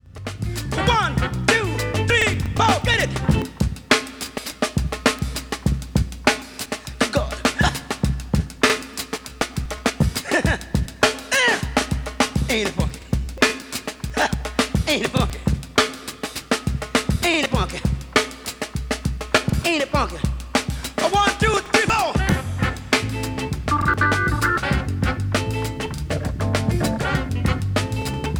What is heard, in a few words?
FUNK DRUM